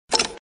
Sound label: Upgrade Stat; Mighty Knight 2